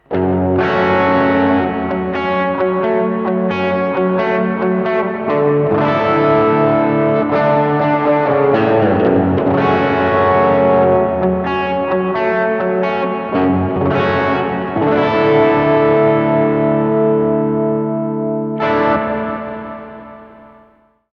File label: Overdrive Reverb